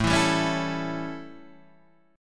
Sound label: A 7th A, Guitar Drop D; chord